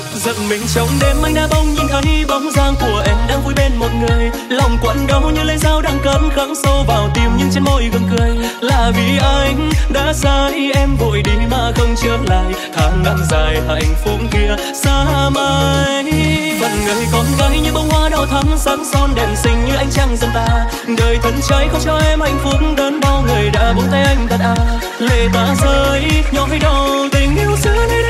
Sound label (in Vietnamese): Nhac Chuong Remix